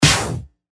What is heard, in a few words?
Snare; Gum; revealed claps snares vol 2